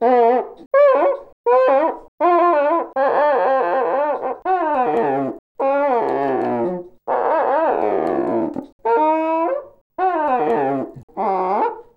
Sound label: cuica long mono